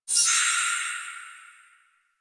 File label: Mine Warning Pulse; Warframe